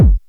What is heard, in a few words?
tight lows kickdrum sample f sharp key